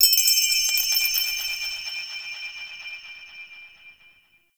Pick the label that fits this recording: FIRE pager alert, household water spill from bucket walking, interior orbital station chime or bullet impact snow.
interior orbital station chime